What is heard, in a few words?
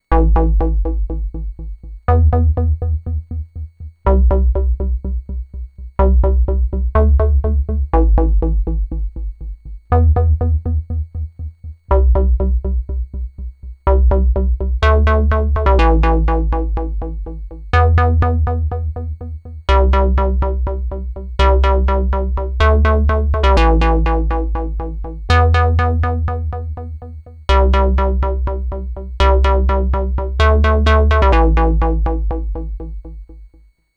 monologue fake delay